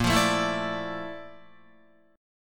Bb Major Sharp 11th Bb down Guitar, chord; strummed; JGuitar

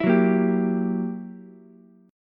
Fsharp 7th Flat 9th down Ukulele C6; strummed; JGuitar

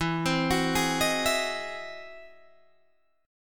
E Augmented Major 9th, Guitar, Open D

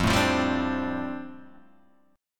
F 6th Add 9th F down Guitar DADGAD, chord

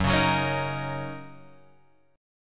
Gb 6th, Guitar Drop D

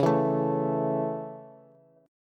C sharp Minor Sharp 5th, Bass; strummed; chord; JGuitar

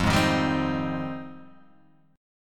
F Minor 9th F

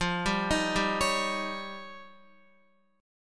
F 7th Suspended 2nd Sharp 5th, Guitar; F7sus2#5; arpeggiated; JGuitar

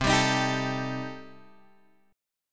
C Minor 6th C Guitar Open F; Cm6 strummed; 12,14,15,14,12,x

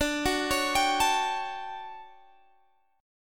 D Major 11th Guitar Standard, chord; arpeggiated; JGuitar

